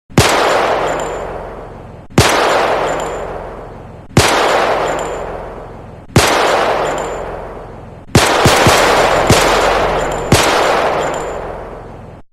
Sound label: gunfire; black mischief